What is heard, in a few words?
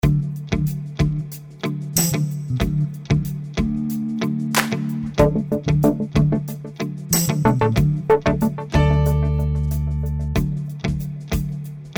Traditional Track